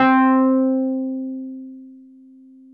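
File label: Wurlitzer electric piano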